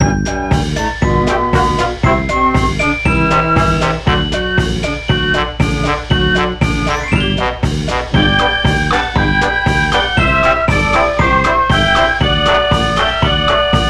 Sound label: Harp gliss; simpsons hit run; ps2